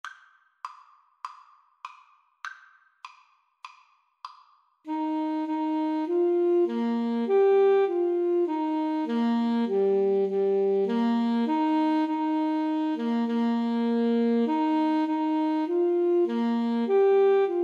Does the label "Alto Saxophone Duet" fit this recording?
Yes